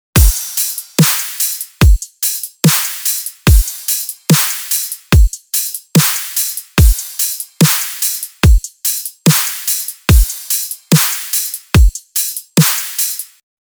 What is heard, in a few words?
Drums